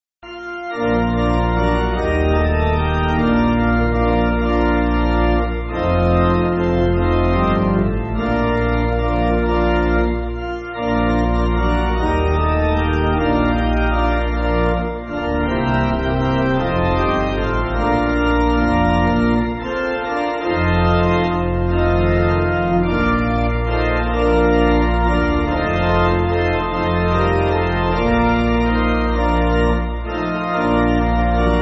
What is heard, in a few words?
Pipe Organ, Backing Files for Churches